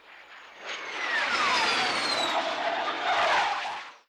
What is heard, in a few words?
MIG Sweetener, Aircraft; Sony Pictures Sound Effects Series; Vehicles